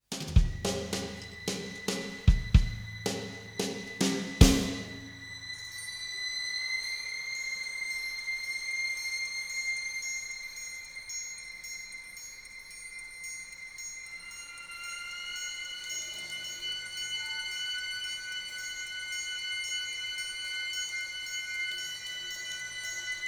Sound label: no brass; Neon Genesis Evangelion; Evangelion S2 Works